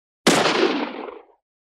vystrel iz pistoleta

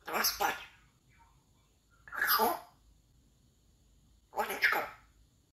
zhako pora spat, zvuk popugaya zhako

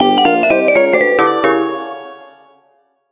zvuk provala

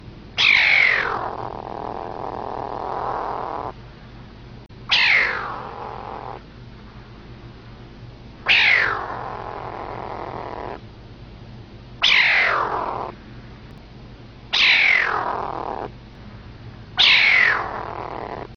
karakal

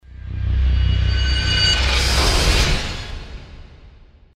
perehod kiberpank

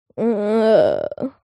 kriahtenie rebenka

